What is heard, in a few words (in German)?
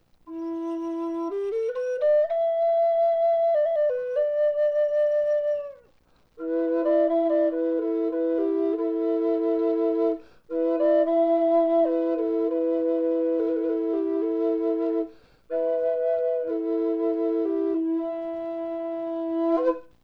Hoerprobe E Drohne Birne Ahorn Nuss